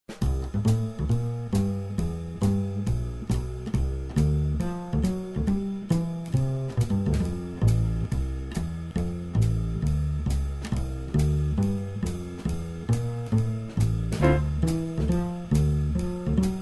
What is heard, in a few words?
Walkin Bass